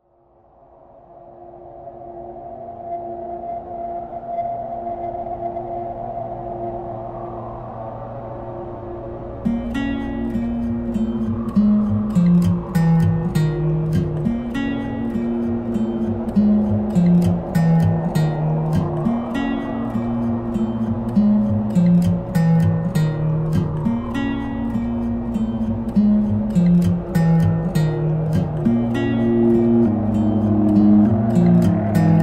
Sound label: thunder